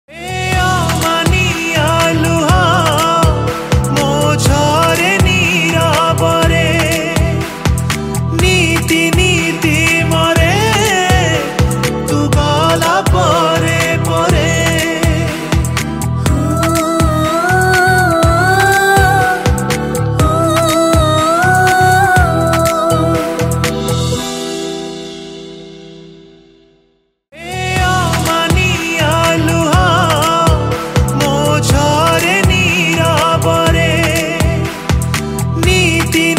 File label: Song Odia